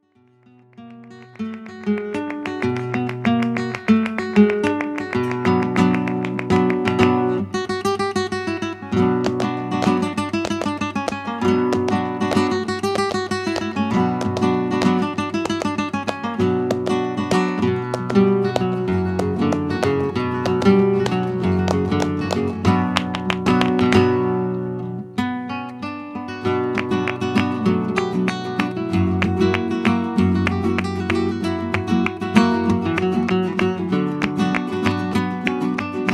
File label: Aire de Rumba, rumba, flamenco; Curci